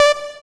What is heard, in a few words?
SYN POLY; Sound & Vision - Gigapack I CD 2; JD 800/990